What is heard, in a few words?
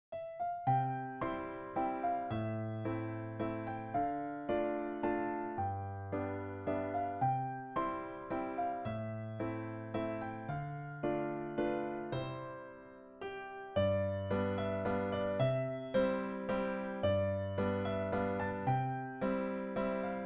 lullaby